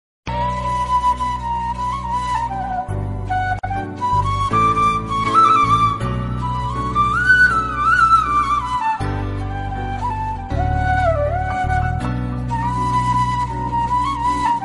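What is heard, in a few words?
Love background music Telugu Ringtone; Anjali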